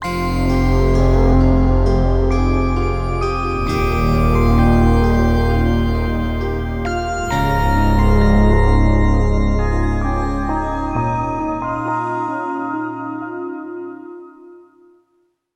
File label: GameOver Intro; Dungeon of the Endless